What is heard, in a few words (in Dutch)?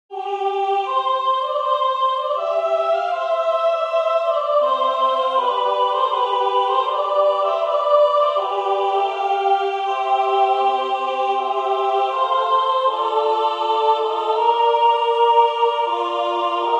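vrouwenkoor soprano II; H.Purcell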